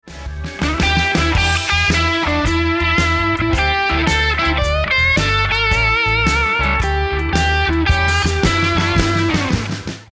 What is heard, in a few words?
Licks Lick, guitar